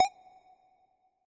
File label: button Click; reel rush